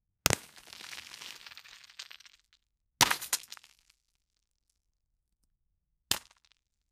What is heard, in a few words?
collide effect